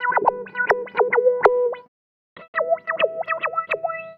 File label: Wah Guitar; Sonic Foundry (Sony Creative Software); Stylus Pressure Urban Grooves on Digital Wax; Wiggle Disc